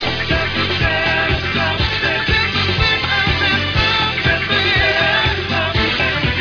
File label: ten perc